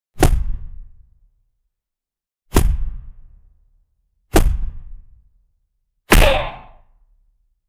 pow, classic punch impact; boxing

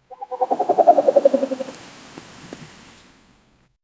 eagle wings; freedom

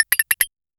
short premium purchase so; thwack

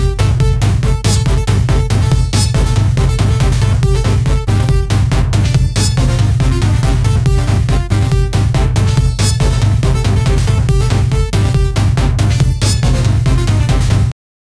game boss fight music

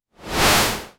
a soft futuristic whoosh, warping

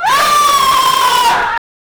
multiple womens scream; sound effect

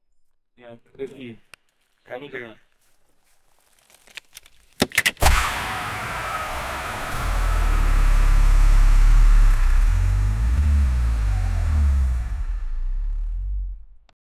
drinking alot

